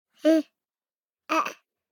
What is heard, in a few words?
BABIES TALK Boy 12 months Hee Ahh; Baby Boom